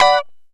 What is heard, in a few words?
Lounge Guitar Chick 9ths E4; Lounge Guitar Chicks